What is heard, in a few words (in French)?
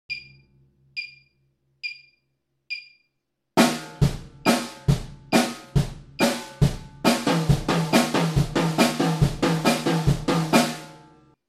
break base double croche